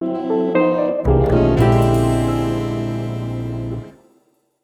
Failure Stinger

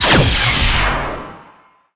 Android

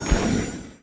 Hover Car Ignition

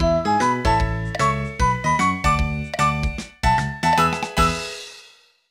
Music Win; Tales to Enjoy The Ugly Duckling

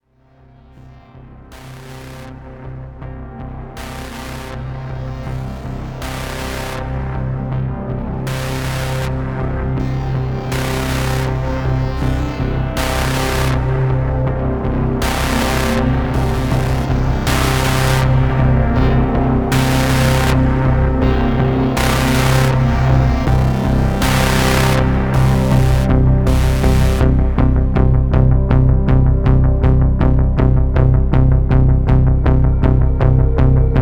Tank Music Plaza Intro; Battlefield 3

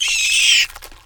insect die; Insects

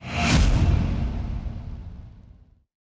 dramatic reveal

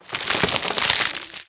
bone fall; ice age scrats nutty adventure; Rapids

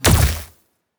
Weapon Shoot

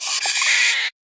Warrior Ram End; we are the dwarves gamerip 2016

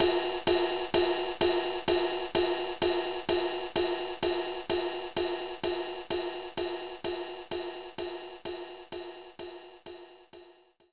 ride

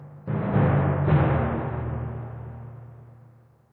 Tymp Hit; Lost Zasad

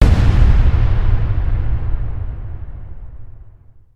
Done Hit; NIS

